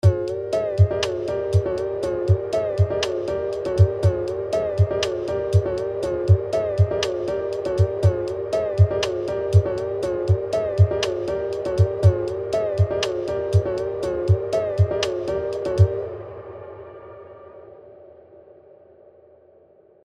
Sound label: Melody